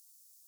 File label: Pipistrellus pygmaeus; europe